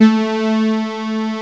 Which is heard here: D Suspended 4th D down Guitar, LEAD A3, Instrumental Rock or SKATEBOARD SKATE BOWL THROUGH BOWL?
LEAD A3